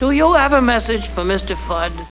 death; quake2; fuddbeta

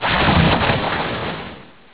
drown; quake2; citrusfrog

